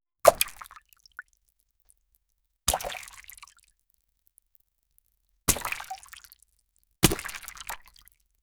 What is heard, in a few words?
a small object creating a gentle splash as it hits water; serene